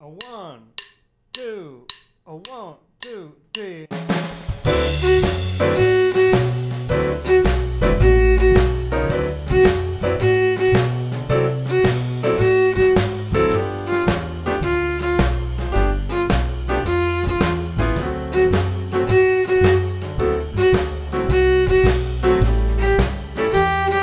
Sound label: Play Along Inner Melody With Rhythms